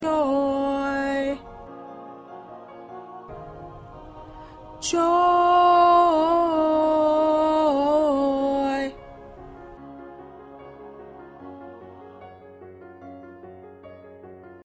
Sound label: Backup Alto